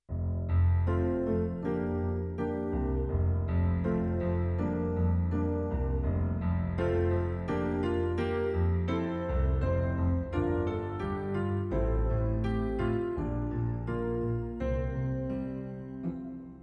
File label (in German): (Impro) Nun bitten wir den Hl. Geist